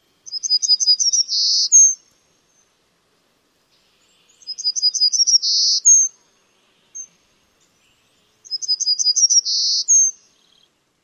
song type

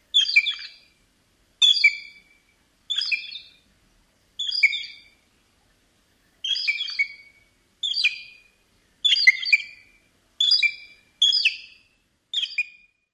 squeaks; NY

